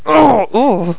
OW OOO

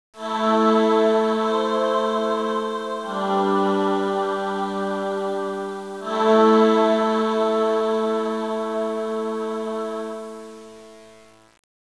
CHOIR

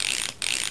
REEL CLIC

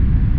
thrust

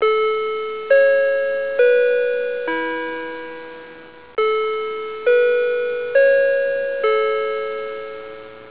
HOURLY, CLK

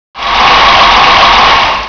CROWD

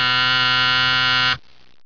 BUZZER

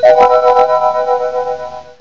ALIENS

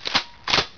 cock gun

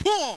pull

